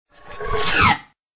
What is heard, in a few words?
FX Space Cartoons